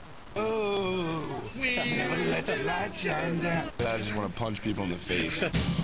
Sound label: sing punch